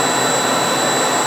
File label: jet loop